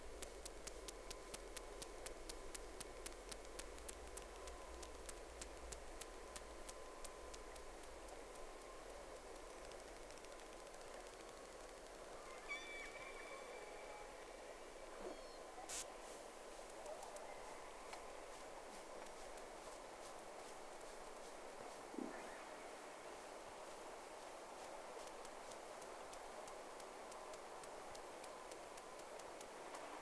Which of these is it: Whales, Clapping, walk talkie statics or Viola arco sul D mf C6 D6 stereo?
Whales